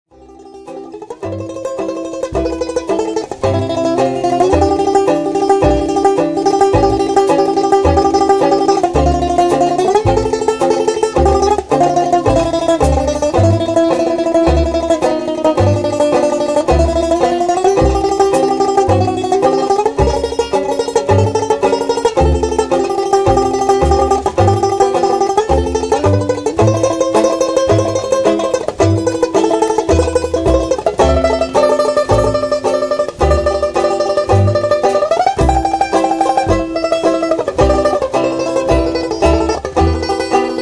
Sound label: banjo